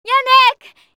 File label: hint; ICO